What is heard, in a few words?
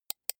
computer mouse right click soft